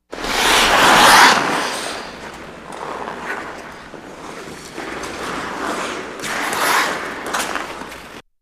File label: Hockey Great Close, hockey